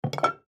Liquor Bottle Down, Foley Liquor Bottle Set In Cabinet Clank; Bars and Clubs; Blastwave